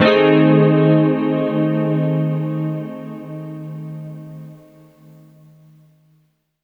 pad; Sonic Foundry (Sony Creative Software); Ugly Remnants VOL-2

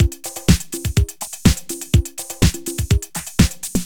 LOOP 124

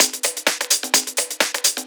Discohouse Loop 128 BPM

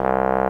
TBONE C2, TROMBONES; Greytsounds VOL-1

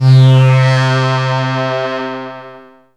synth, c, Sweep Synths; Dance Synths

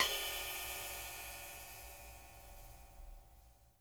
SIDE ON SIZZ, cymbals; Sampleheads - New York City Drumworks VOL-1; SW CYMBALS

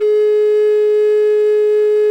MOECK G#4; SoundBytes - Early Patches

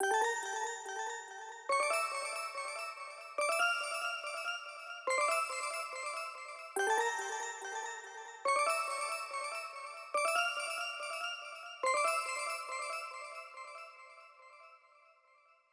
PWM Kit 142BPM Chorus - Bells WET, Gmin; Constr Kits; Melody & Synth; Vengeance; Essential Clubsounds Vol.5

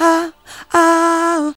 SUNG; AMG

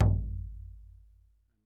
BLACKFOOT, American Natives; E-MU Formula 4000 Series Vol. 4; Earth Tones

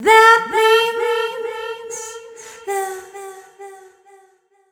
f mel g, fem Vocals

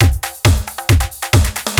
EURO LOOP, breakbeats; Crypton - DrumBase5000; BREAKBEATS#2

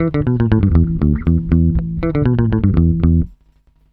EASY BASSE; USB Soundscan vol.32; AKAI

